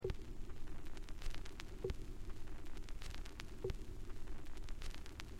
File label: record noise, turntable